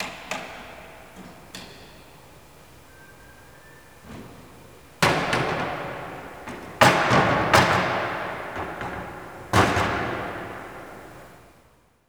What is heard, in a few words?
Cathedral Organ; ILIO; Propeller Islands